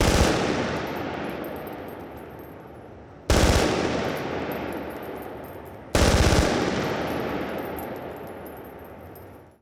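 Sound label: Machine Gun Wet Shell Casings Interior; Weapons & Firearms; Sony Pictures Sound Effects Series Volumes 1-10; Sony Creative Software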